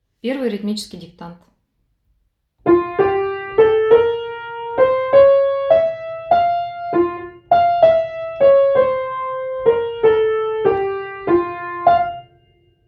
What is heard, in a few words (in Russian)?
ritmiceskij diktant